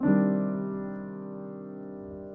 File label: one mellow piano seventh; Piano and Epiano